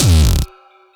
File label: kit, Yamaha Motif-ES8